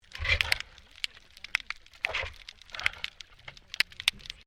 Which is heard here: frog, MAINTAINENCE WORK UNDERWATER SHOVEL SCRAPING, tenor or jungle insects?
MAINTAINENCE WORK UNDERWATER SHOVEL SCRAPING